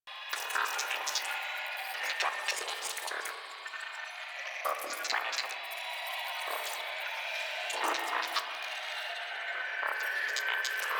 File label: Sacrifice Vitruvian Slide Ambient Loop; Warframe; Quests; Chamber